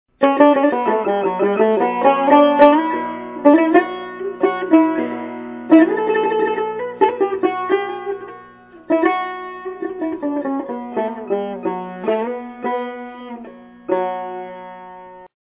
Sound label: tar